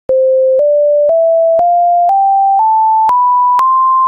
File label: doremi, musical scale